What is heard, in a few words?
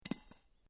METAL PIPE FALL; Sound Effects:Materials; Foundation3